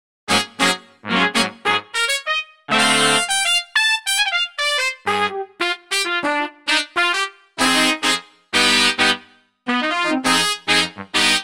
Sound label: Droit Brass